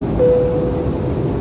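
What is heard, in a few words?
plane single chime crew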